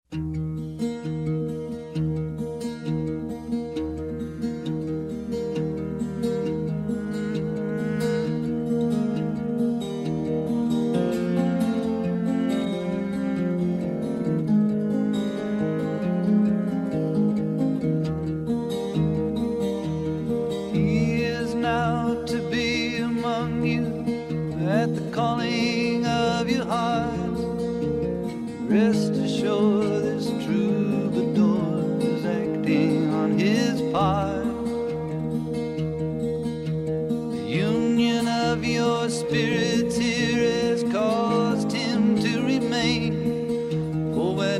wedding song vocal

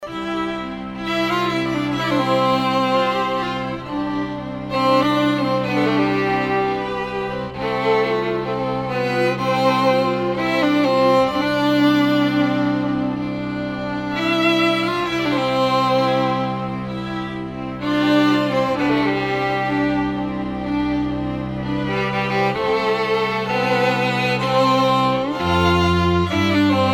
strings, ceremony music

